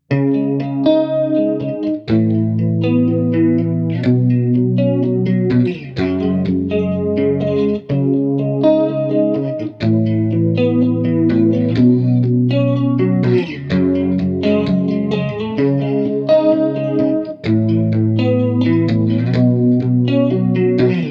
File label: bias vibrato dezent